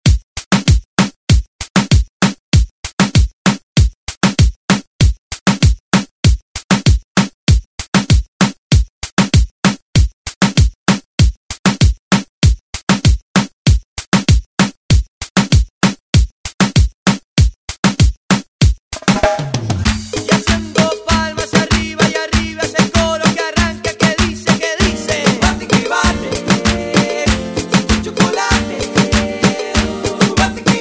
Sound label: Latin Redrum; Deejay Hit; remixplanet